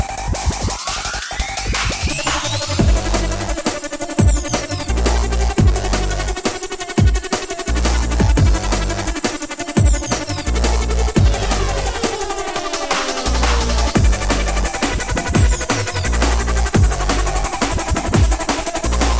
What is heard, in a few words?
drum n bass, happy birthday